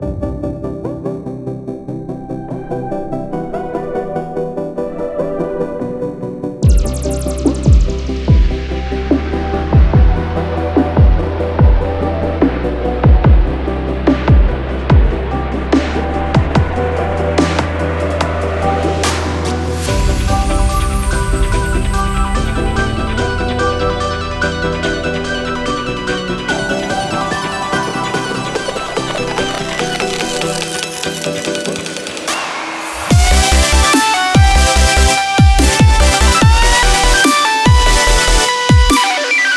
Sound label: Mello; Unison